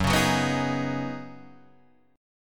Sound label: Db 7th Suspended 2nd Suspended 4th Gb